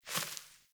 Sparrow One Wing Flaps; Animals

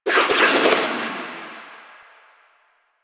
Kunai Reload; Warframe; Weapons; Choir

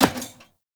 Heavy Grunt Boot Walk Metal, footsteps; Characters; Enemy; Sinecure

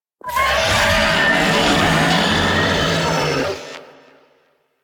Vocal Attack, Enemies; Warframe; Teralyst; Ropalolyst

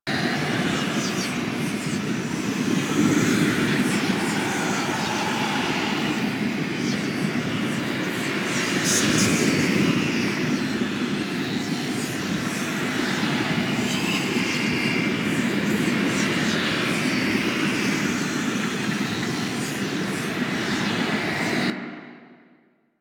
Finger Reliquary Loop; Warframe; Rail Jack Hunt

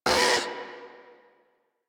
Catbrow Combat Vocal Pain; Warframe

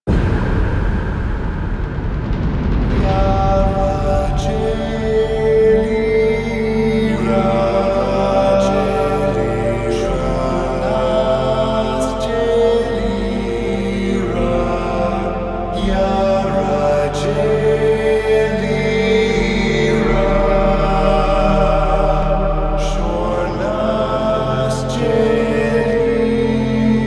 Climax Cue, Music; Warframe; DE; Entrati; TennoCon2023

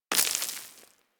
Bullet Impact Debris Dirt; Warframe; Weapons

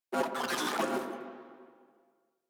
Moa Vocal Enemy Spotted; Enemies; Warframe

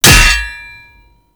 parry axe; Warframe

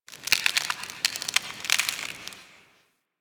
Ice Creak Echo

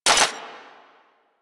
Long Gun Mech; Warframe; Thano Tech Arch Long Gun

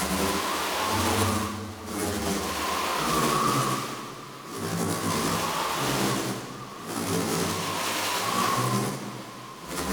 Song Bubble Loop; Enemies; Abilities; Warframe; Teralyst; Bigalyst

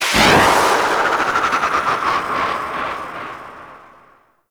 Archwing Healer Engine Boost Wind Down, Vehicles; Warframe

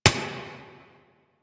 Lasrian APC Turret Low Clip, Weapons; Warframe

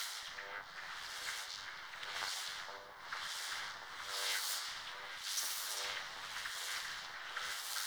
Ult Ready Loop; Powers

